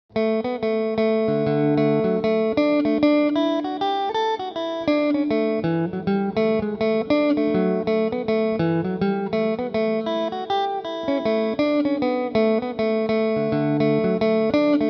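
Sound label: jig D major, mandolin; A Smile From Sile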